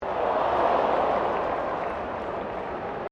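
crowd 40K Melbourne Cricket Ground aww low, Cricket at Melbourne Cricket Ground; Crowds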